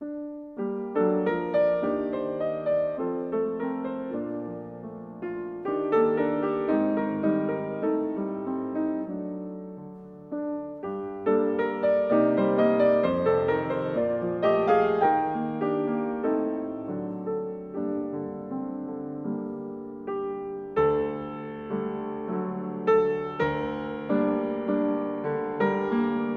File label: Piano etude I